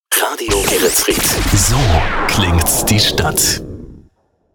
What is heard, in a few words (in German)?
WERBETRENNER JINGLE; Radio Geretsried